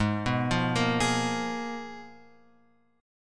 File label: G sharp Minor 6th Add 9th; G#m6add9 Chord {4 2 3 3 X 4} arpeggiated